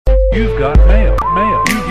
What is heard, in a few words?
Mail Hip Hop